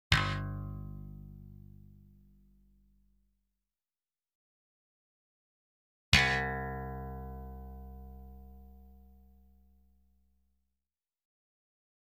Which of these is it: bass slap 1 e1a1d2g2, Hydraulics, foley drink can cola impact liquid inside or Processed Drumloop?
bass slap 1 e1a1d2g2